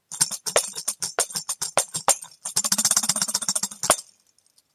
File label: sake pack tambarin